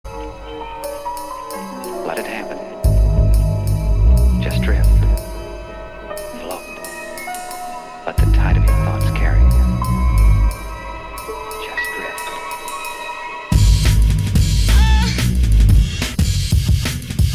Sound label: Drum and Bass Jungle Old Skool; UK Bass Radio